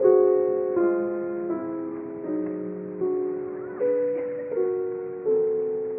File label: piano, Loops Sad